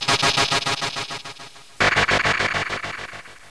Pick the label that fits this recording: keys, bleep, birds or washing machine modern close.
bleep